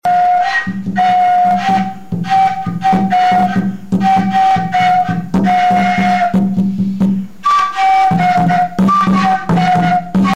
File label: segmento, tenek